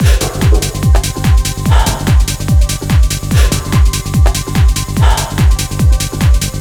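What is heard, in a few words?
145BPM G min, groove techno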